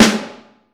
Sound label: Vintage Snare Drum